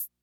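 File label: closed hi hat, rhythm330, classic machines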